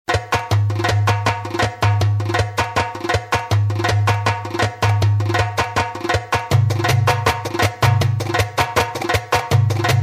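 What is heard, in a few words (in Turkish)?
Roland GW-8 çiftetelli ritmi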